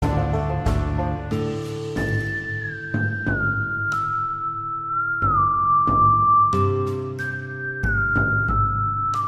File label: old western music cowboy music